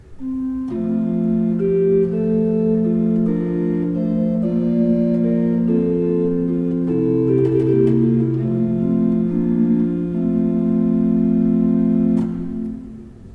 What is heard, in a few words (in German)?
gebraucht orgel; Kemper